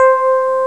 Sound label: EPiano; HyperCard 2.0 Training